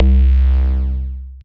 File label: Boom Bass, Synth Bass; Ableton; Factory Packs; Beat Tools